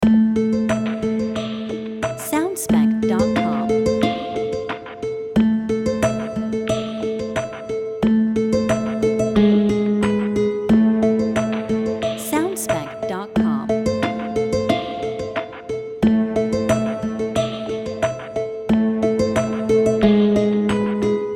Mystical Triphop Lite And Short

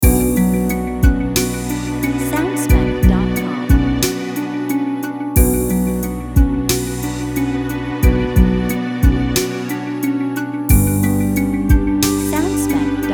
Neutral But Mystical Theme Loop

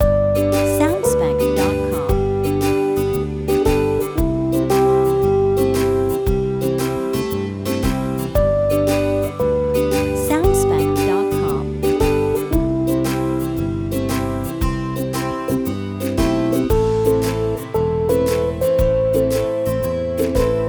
Positive and Lite Loop

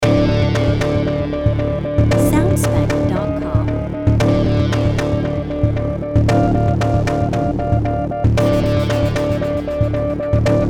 Danger In Action

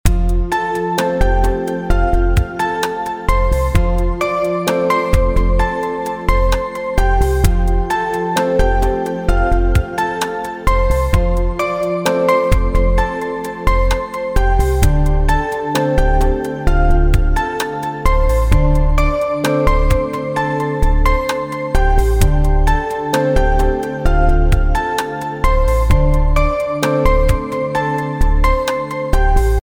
Lounge

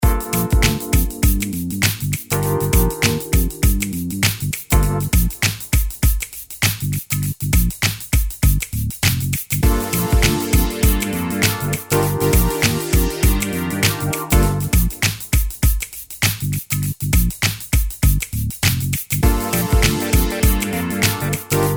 Feel Funky

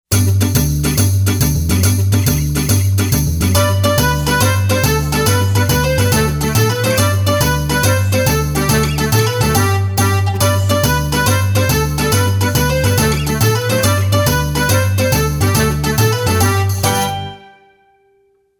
Italian Tarantella Dance Short Version

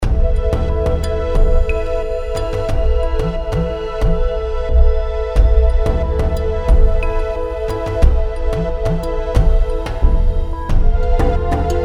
Mystic Lounge Main Loop

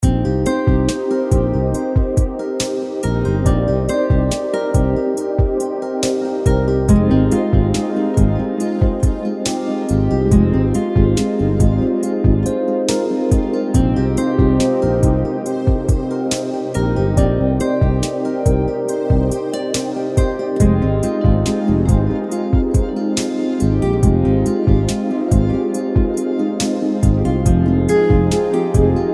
Sexy Lounge

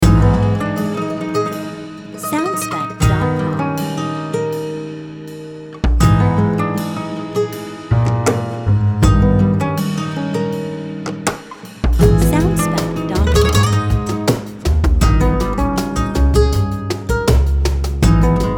Flamenco Guitar Passion

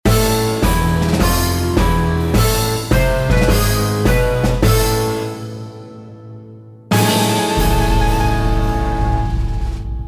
Wicked Cartoon Characters Ending

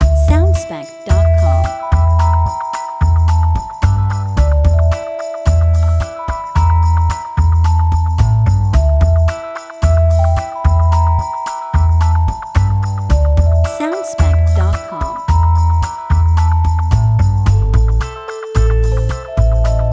Funky Gamblers Groove

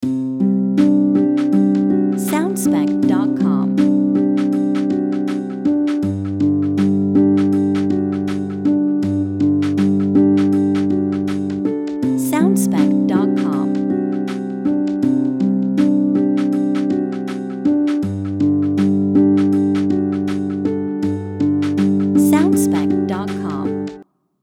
Lounge Amb Guitar Loop

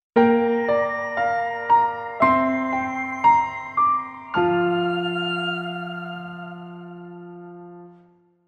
Soft Piano Logo

